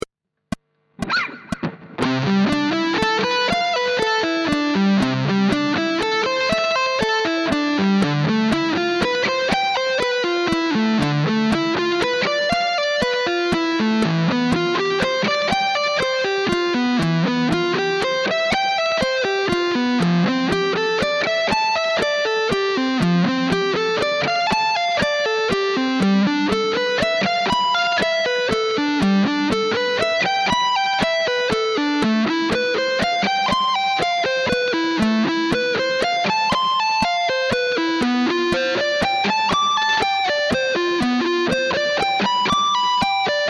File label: moodit 6 string pattern